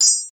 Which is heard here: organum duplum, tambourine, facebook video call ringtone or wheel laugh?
tambourine